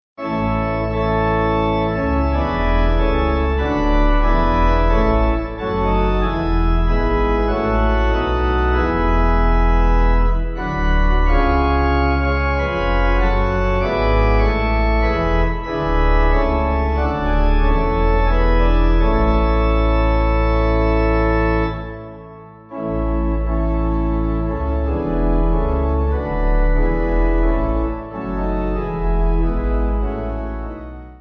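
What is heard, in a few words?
Pipe Organ, Music Backing Files for Churches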